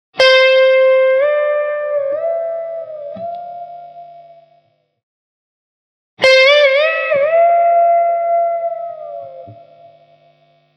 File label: 2 half tone bend, guitar